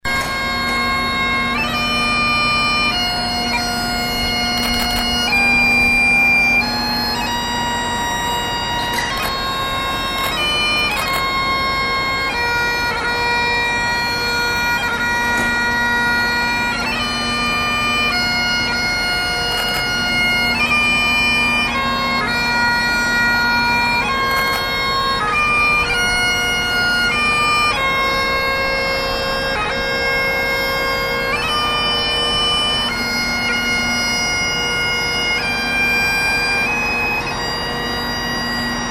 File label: Bagpipes